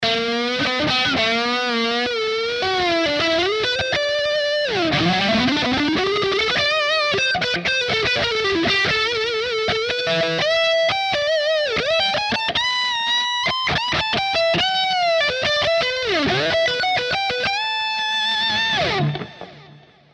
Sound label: Neck SC lead; Ibanez AZ226